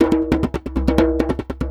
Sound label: Djembe loop 140 BPM; musicradar